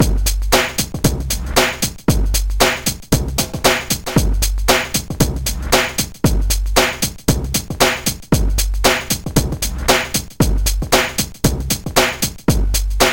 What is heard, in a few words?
funky beat; Trackers; Modland